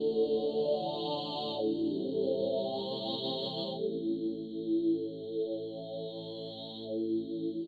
synth pad, ethno, 125, A; eJay; Sphere

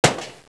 38 CALIBR, GUNS

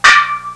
asian metal drum; Sound Effects for Multimedia - Volume 1

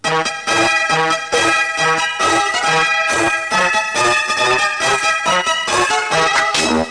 theme

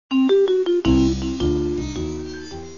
start Up

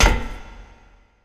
foot thick metal

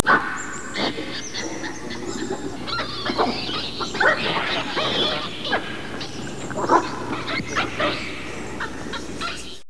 baboo

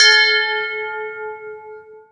bells

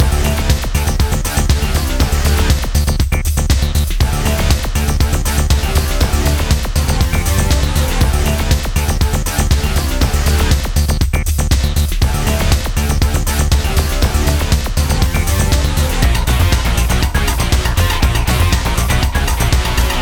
loop; Digital Culture Stream - Volume 4A; Beast

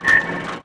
Pickup Mace; SWARM

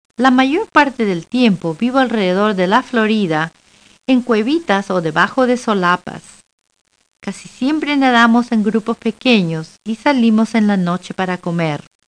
Sound high hat

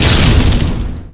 ground hit; K TANK; beehover

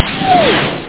warp; wacky

